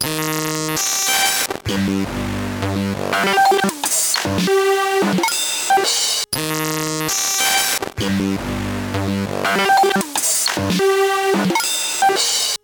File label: psr 37 freez loop; Circuit Bending a Yamaha PSR 37; Freez Loop 1